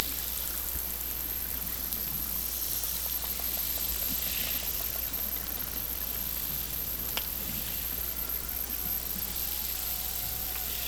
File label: Hydrophones, orcasound